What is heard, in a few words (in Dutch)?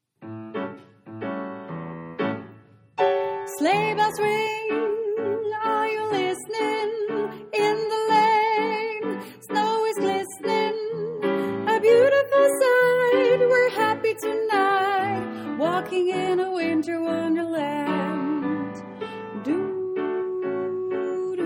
Walking Sopraan